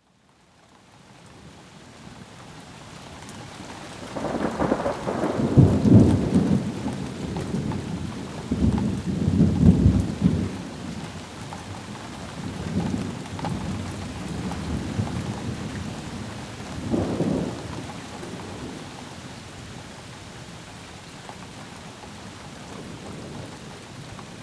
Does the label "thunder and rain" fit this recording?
Yes